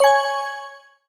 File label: blip; ally; betray